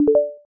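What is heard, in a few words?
Ubuntu message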